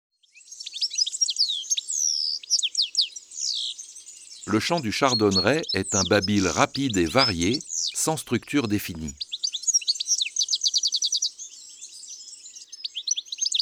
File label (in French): Chardonneret elegant